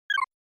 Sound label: SNES RPG Miss